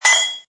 Metal Cup Dong; Metallic; Metal sound; Sound Effects:Multimedia; Lynne Music

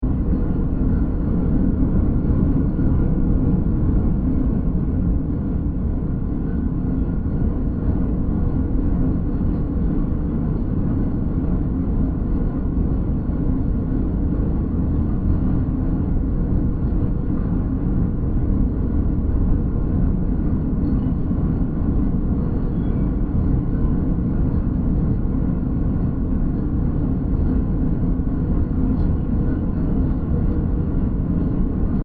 Dark Generator Ambience 120bpm; Scary Soundscapes; Horror; Crafted Signals

